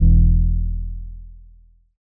Synth Bass - E; Lofi; Cymatics; Lofi Starter Pack

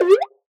Retro FX; Cymatics; Video Game Sample Pack Vol 1

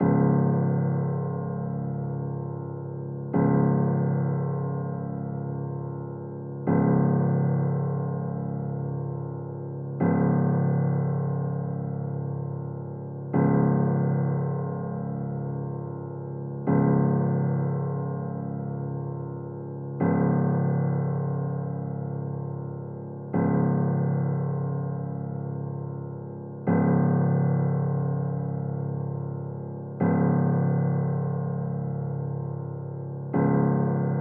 144 BPM C# Min Piano; Loop Stems; Cymatics; Warning; 2022 Melody Collection; Drill

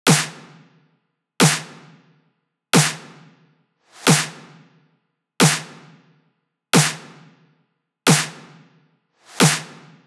Trap, Snare, 90; Drum Construction Kits; Loops; Ghosthack; Drum Mega Bundle Demo; Drum Hero 5; Trap 1

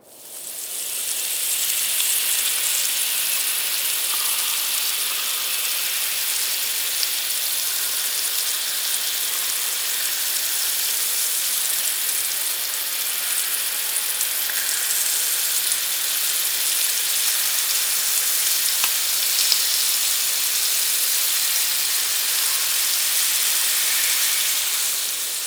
Trap Ambience, Background Ambience; FX; Cymatics; Cobra Hip Hop Sample Pack

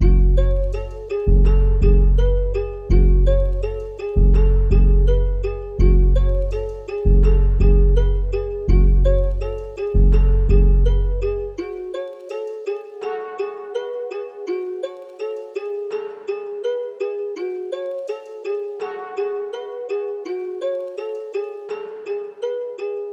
166 BPM F# Min, Trap Melodies; Toronto; Cymatics; Trap Starter Pack

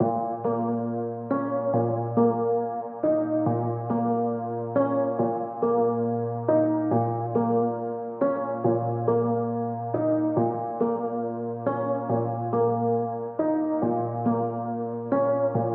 139 BPM A# Min Piano; Cymatics; Drill; Savior

